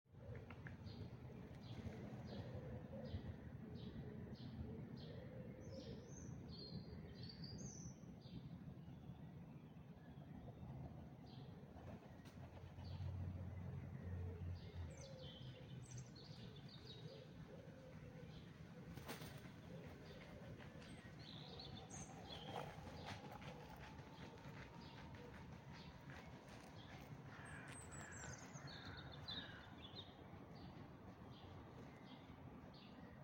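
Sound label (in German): Dawn Chorus, Vogelgesänge vor Sonnenaufgang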